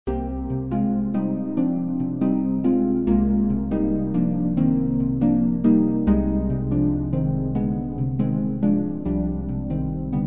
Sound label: wurly wonderharp in C with switch